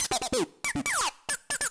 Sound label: PERC LOOP INDST 140, Industrial